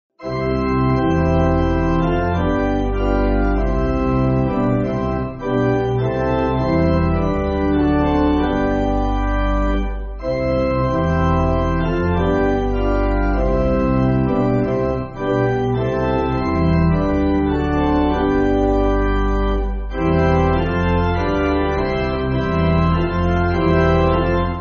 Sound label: Pipe, church music; Lindeman